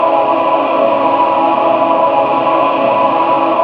vocoders; Sound & Vision - Gigapack I CD 2

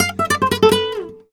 e, Flamenco Guitar Picado; Picado 1

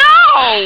rattle ough; Amiga Soundtracker Sample Packs

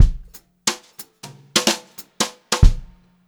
jazzy fill, Brushed Drum Loops; USB Soundscan vol.39; AKAI; 90 s Sample CDs

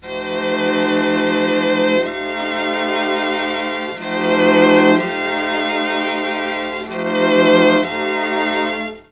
D4, Mellotron; Mike Pinder - Mellotron Archive CD

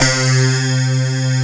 SY big synth

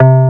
TG BASS C5; Best Service Dream Experience

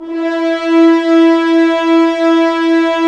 STR, HZ Horns; Roland - LA Composer vol. 4

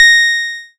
PLUCKED, Synclav FM, A#; Big Fish Audio

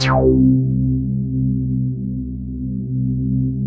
DEEP BASS; Masterbits; Dance Trax

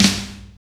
kit, GTR; Roland - LA Composer vol. 2

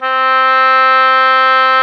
OBOE; Sound & Vision - Gigapack I CD 2 (Roland); Brass&Woods1

